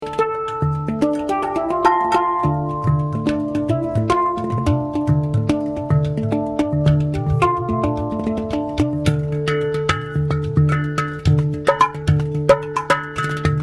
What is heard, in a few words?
hang udu